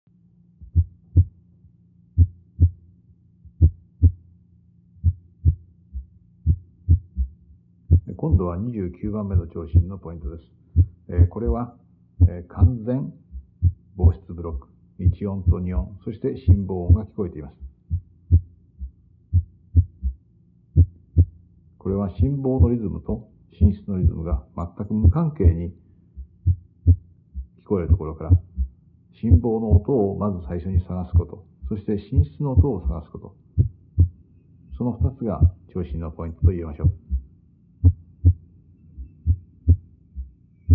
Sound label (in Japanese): complete AVB 完全房室ブロック